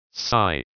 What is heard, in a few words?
sigh